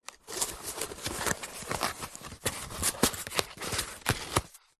cardboard box small lid flaps open close movements; zapsplat